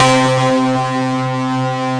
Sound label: Synthesizer Middle C Notes, Lead